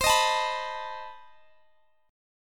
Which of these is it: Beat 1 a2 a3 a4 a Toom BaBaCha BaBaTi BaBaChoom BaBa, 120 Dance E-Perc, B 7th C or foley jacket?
B 7th C